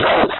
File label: Chant